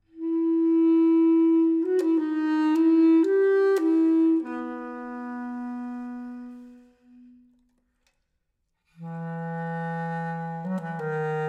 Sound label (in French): Solo De Clarinette Basse; Les Huguenots